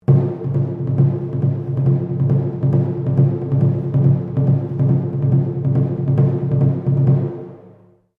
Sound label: Drum Taiko Barrel Sequence, Japanese Taiko Drum; Miscellaneous Musical Sound Effects